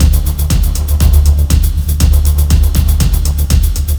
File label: BEAT